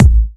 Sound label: Kicks; Vengeance Samples